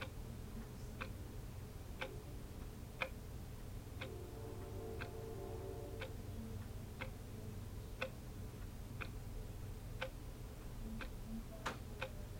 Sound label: knocking bedroom; N Ohio; Spfld